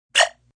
Blip; Beeps; multimedia

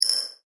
multimedia rollover, Multimedia Rollover Tone; Tones; Sound Design; McKinney Sound

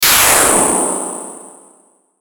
Hit Flange Reverb; Sound Design; Blastwave

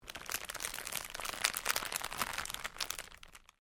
Cellophane crinkle; Material components for constructing more complex sounds; Plastic; Sound Effects:Materials

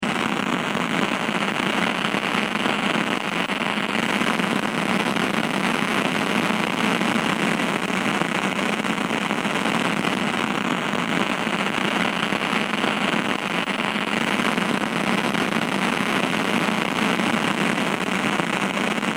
Fire Flare Loop; Flames

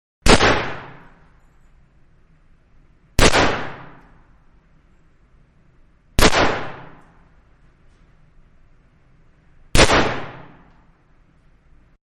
Guns Magnum 357 Revolver; Manhattan Production Music

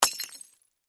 Crash Glass Brandy Bar Drop Break; Materials; Sound Effects:Materials; Blastwave

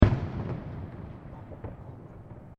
Fireworks Single Explosion; Backgrounds; Crafted Signals

